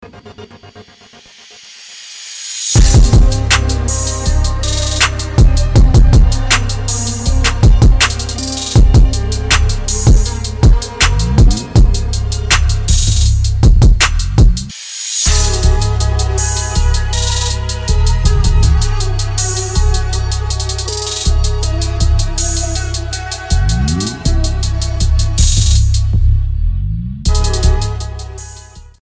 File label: deep Arabic violin